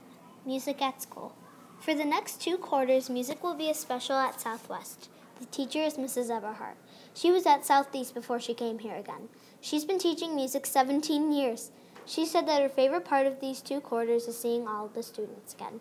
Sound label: music at school